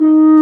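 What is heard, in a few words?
brass, F.Horn Solo; Roland L-CD702; F.Horn 4 Sh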